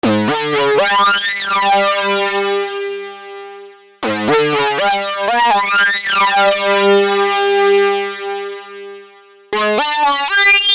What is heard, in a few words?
hard sync anim; tellun